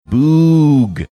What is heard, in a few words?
boooooog